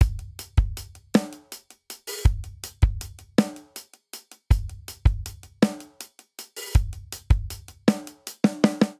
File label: Straight Six Rock Verse 6/8 80 bpm, Drum; MIDI Clips; Ableton; Drum Booth